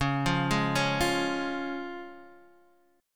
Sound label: Db Major Db down Guitar Half Step Down